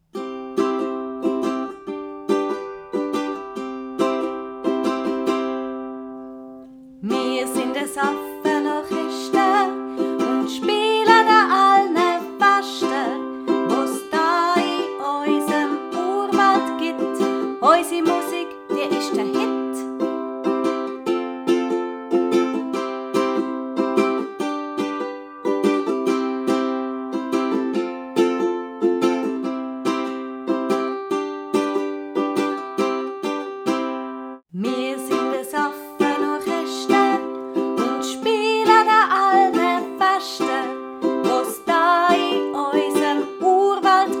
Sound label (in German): Affe Orchester